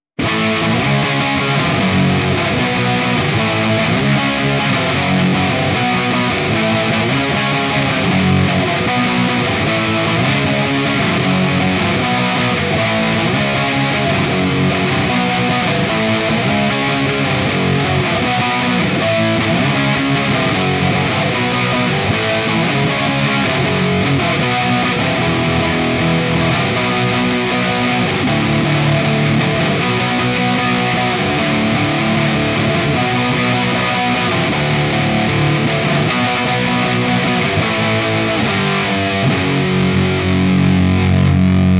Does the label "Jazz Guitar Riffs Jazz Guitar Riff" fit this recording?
Yes